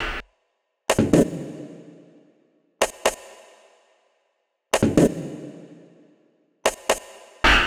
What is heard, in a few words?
Prc Fx Loop 125; Equipped Music; Loungin House; Perc Loops 125